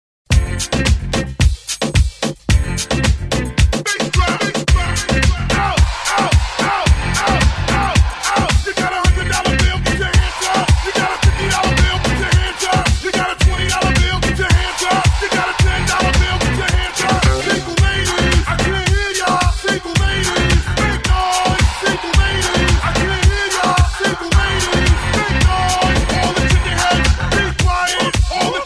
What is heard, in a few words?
Moombahton RMX